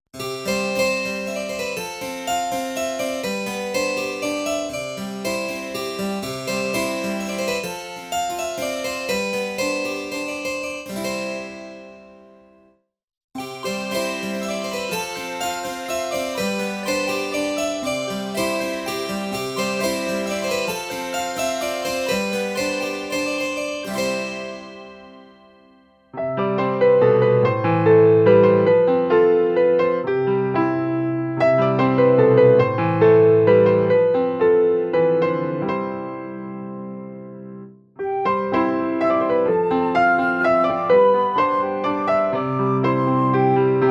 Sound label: The Music Box